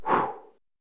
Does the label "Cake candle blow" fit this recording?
Yes